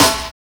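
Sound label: INDUSTRY snare, Drums, HIP-HOP; Drums In Your FACE!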